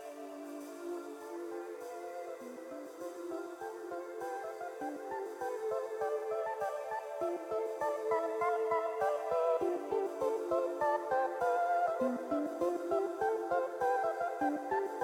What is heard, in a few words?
Music Ambient scene; Game rip; MiSide